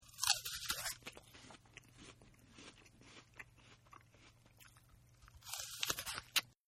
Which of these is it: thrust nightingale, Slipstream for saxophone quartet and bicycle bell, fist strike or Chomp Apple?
Chomp Apple